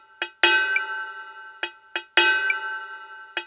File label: Cymbal Ride Percussion; Sonic Foundry (Sony Creative Software); Cinematic Funk Urban Tone Poems